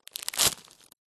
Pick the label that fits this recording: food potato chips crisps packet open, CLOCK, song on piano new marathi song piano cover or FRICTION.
food potato chips crisps packet open